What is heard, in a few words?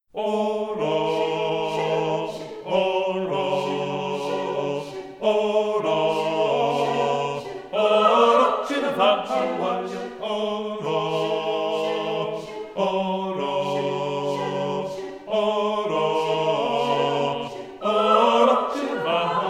Oro se do Bheatha Bhaile Bass